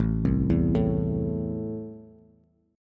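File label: A Minor, Bass, chord strum; JGuitar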